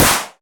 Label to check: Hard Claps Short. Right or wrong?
Right